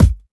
original kick drum sample g key, Royality free kickdrum sound tuned to the G note.; kick drum samples